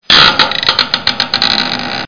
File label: OLD HOUSE creak